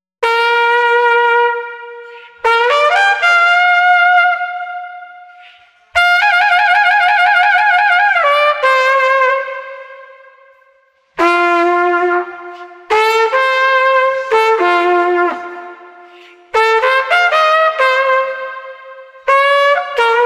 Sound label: Street Trumpet Elevated 85